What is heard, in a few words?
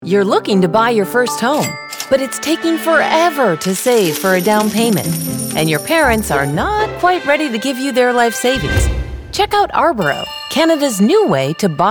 Jingle; Ourboro